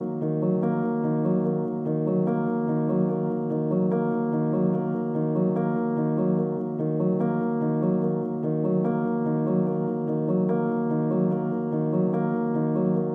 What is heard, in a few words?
146 BPM D# Min Piano; Trap Melody Loops; Loop Stems; Cymatics; Powers